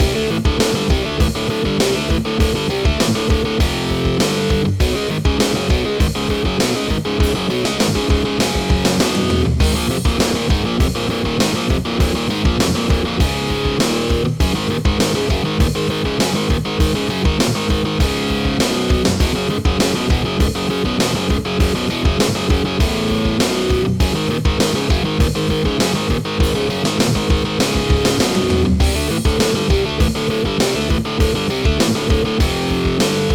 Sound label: Guitar Mayhem; action